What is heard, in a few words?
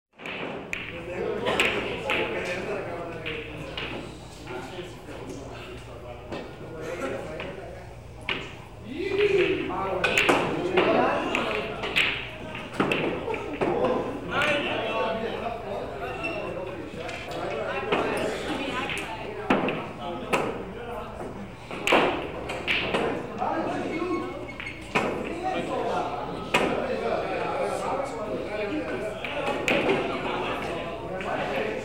Billiards General Atmosphere; KMP Film Video Sound Effects